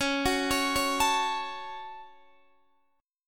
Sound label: Db Suspended 4th Sharp 5th, Guitar, DADGAD